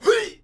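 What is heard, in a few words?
Archer Attack Vox; Civ4 warlords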